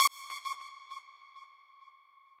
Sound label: lead